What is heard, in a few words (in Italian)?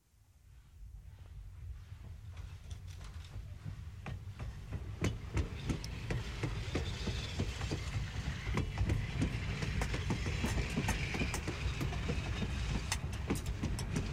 TRENO PASSAGGIO CON FISCHIO; trasporti; treni